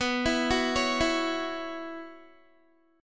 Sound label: B Suspended 2nd Suspended 4th B, Guitar; arpeggiated; 4E,4A,5D,5G,5C,6F; 19,19,16,18,16,x